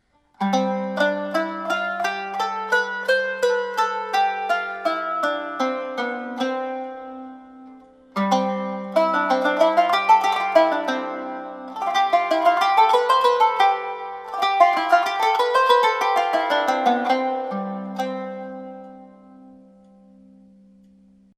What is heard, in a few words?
Major Natural